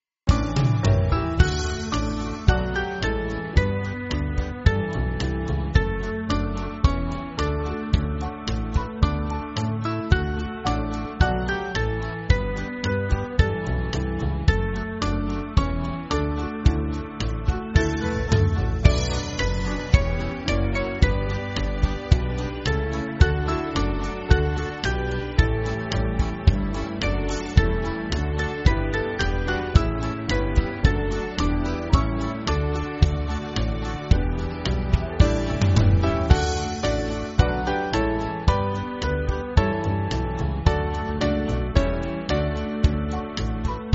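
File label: Band, Music Backing Files for Churches; Monks Gate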